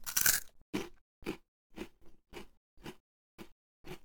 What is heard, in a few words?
sweet eat